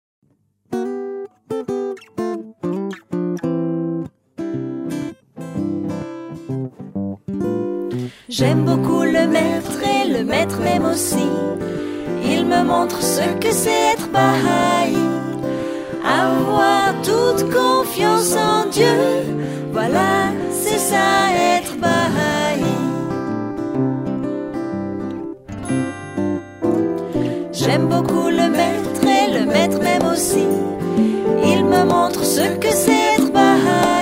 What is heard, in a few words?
Song, FR